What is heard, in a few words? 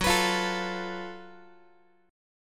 Gb Minor Major 7th Double Flat 5th Gb Guitar; strummed